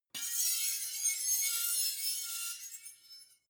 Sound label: two knives large scrape squeak long